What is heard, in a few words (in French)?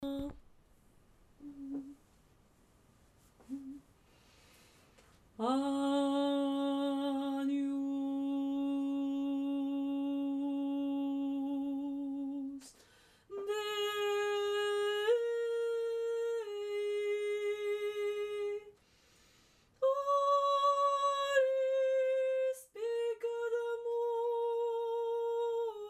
basse octaviée; Ateliers chant; agnus dei